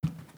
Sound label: step carpet; OneShot Fading Memory